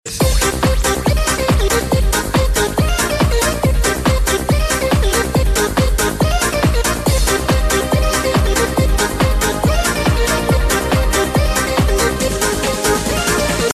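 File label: cool trance mix ringtone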